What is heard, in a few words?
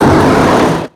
metang; cries